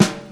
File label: clean snare drum g key; Duration 0:01; Channels Mono; snare drum samples